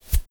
Scatter Shot Arrow; Battle; Ranger

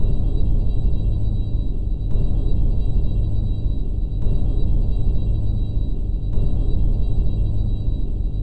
pillar glow

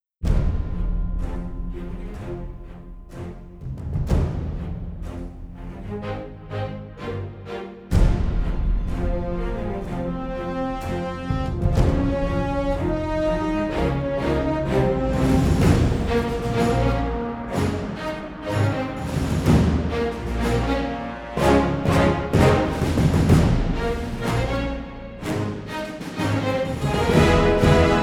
RolePlaying Orch

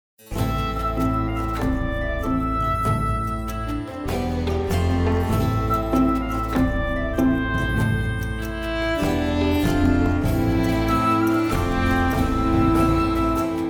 Tavern

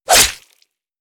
Warrior Cleave; Battle

